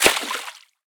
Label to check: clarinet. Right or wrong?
Wrong